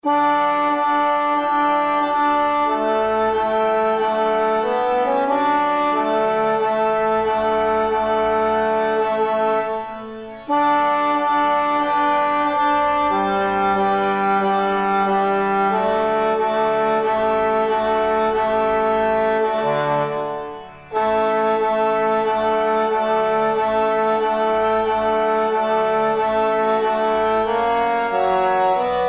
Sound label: Bass